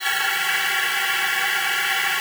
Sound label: WEQS D#6, ALTOSAX; Xsample VOL-2